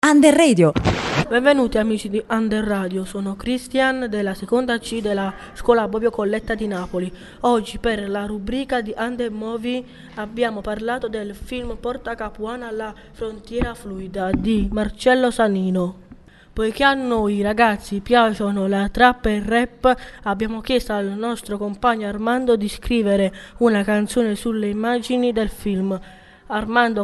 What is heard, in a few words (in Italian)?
Rap